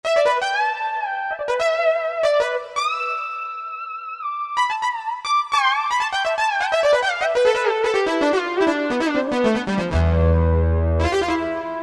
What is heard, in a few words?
Solo Synth, Korg M1; Factory preset examples